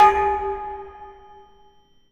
Foley Garage Pipe; Ghosthack Foley Samples and Sound FX

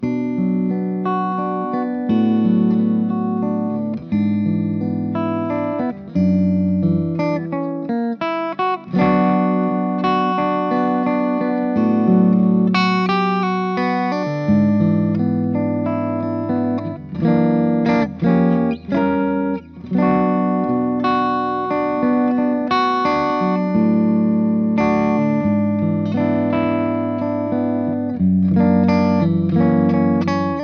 ballad open; laroqua 212 studio test

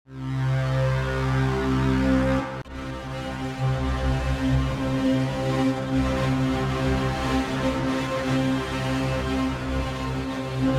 arturia efx fragments

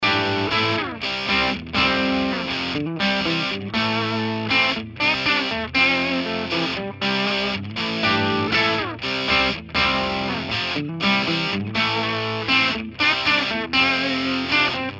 song reamp miced clean amp clean crunch lead

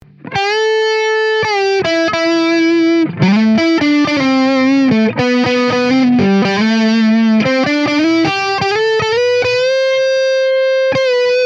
esp ltd vulture lick neck pu; james hetfield signature

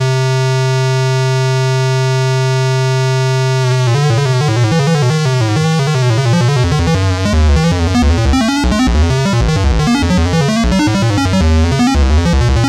king korg joystick fx

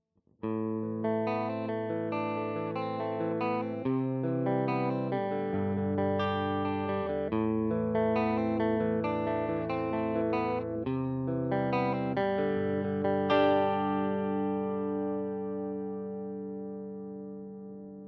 Fender 59er Strat; Line 6 Variax JTV-69

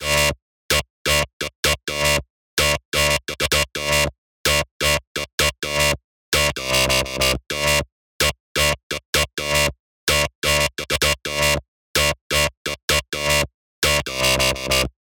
DUB VOICE DRY

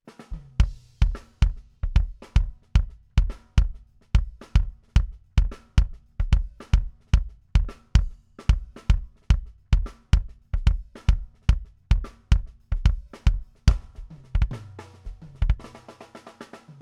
kick drum; shure beta 27

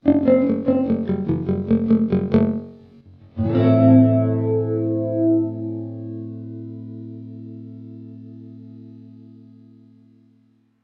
Korg SDD-3000 test phaser; uad

